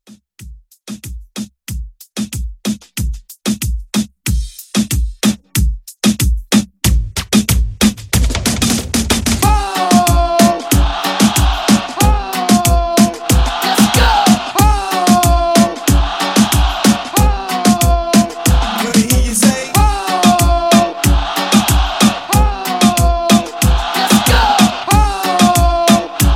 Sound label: Reggaeton Hype, 93